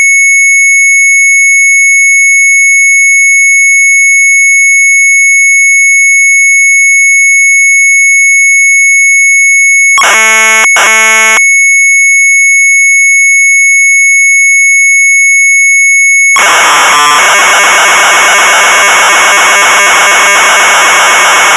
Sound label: Sharp MZ800 Tape